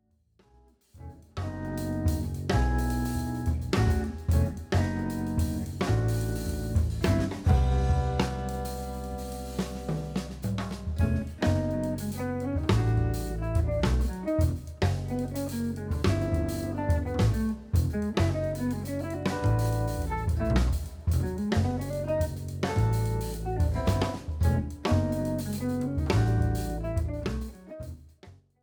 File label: Pulse